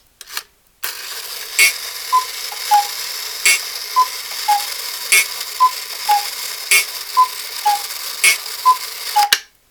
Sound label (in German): Kuckucksuhr